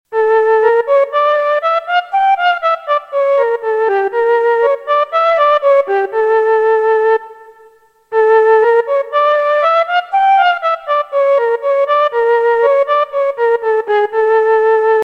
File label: arabic flute ringtone